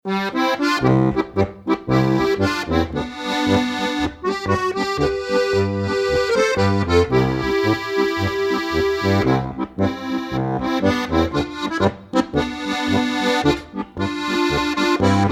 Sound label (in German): GCFB Lied, harmonika